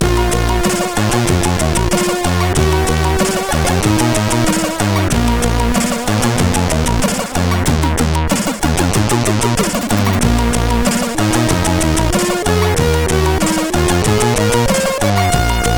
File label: Intro, CSG8580R5; Lazer Intro 2; hvsc